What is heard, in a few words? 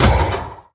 hit, iron golem; minecraft; mob